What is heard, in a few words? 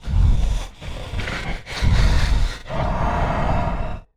Stealth Breath Idle; Evolve; Goliath